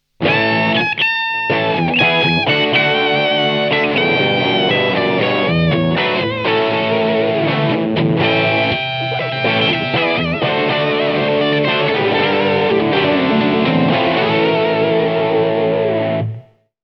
Maxon TOD9

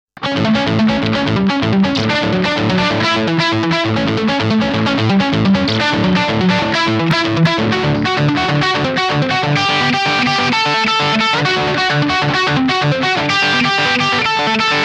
Maxon AD9 Short Delay Normal

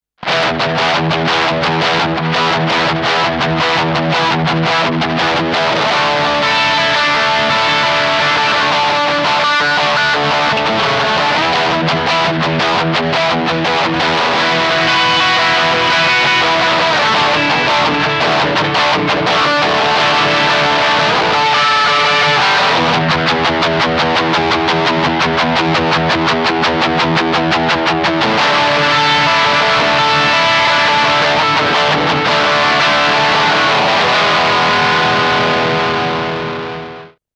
Maxon FL9 Jet Flanging Normal

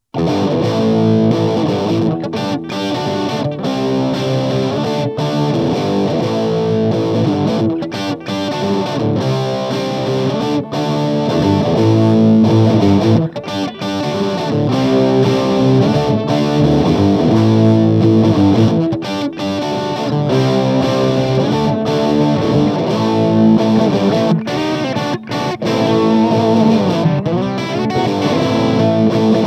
MAXON OOD9

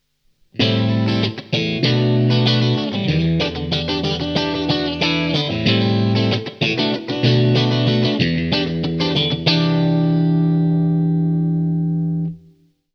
Maxon PAC9

